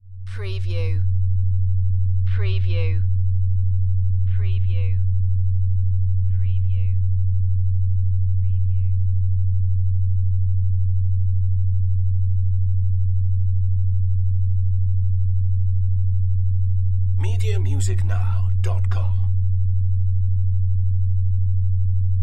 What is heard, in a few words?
Low Buzz Pulses